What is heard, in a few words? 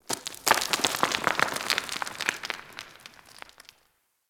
Mining Rock Debris F; Warframe; Ambience; Grineer; Grn Mining Shaft